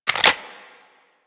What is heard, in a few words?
Clip In, Weapons; Lato Vandal